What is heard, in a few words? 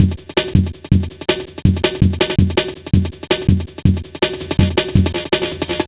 Funki, Jungle, Drum Loops 163.5